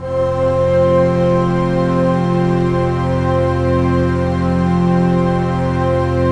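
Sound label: trance pad; Best Service; XX Large - Pads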